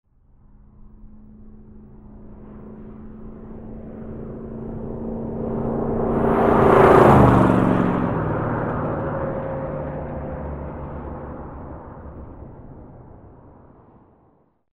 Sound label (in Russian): proezd